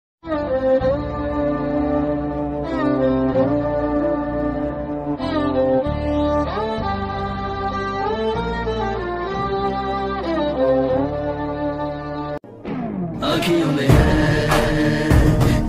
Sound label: movie bgm sound emotional ringtone; sita ram movie